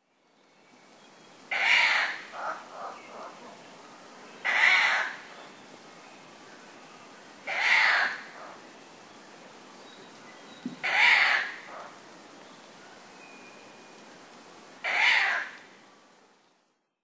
fukuro; tanzawa; koe

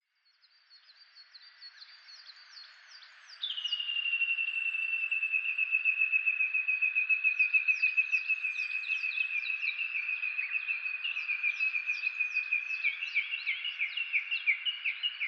uguisu, shiga

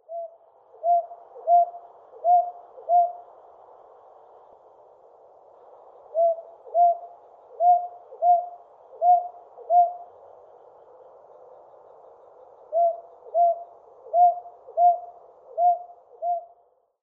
nakigoe aobazuku

